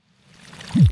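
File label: Portal Pass Through Reverse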